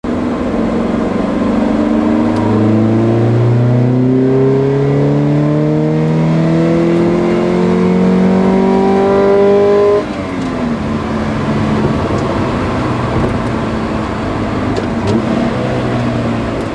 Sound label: filter, speedster